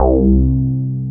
BOW BASS, KORG O1/W; Rubber Chicken Software Sample Bank

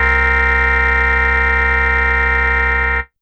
Cold Stab H; YAMAHA RM1X

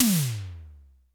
TECHNO; 90 sSampleCDs; Crypton - DrumBase5000

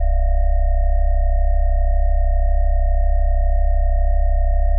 Drone Static; Drums & Drones

